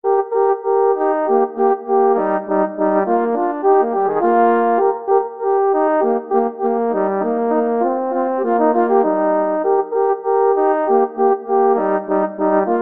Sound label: duos allegro, french horn duet